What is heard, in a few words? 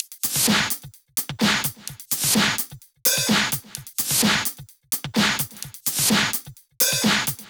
128BPM Kit; Vengeance Samples; VFH1 128BPM Crowded Kit